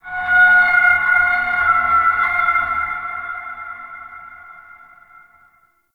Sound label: Metal Bend, Ambience; Warframe; The New War; Zariman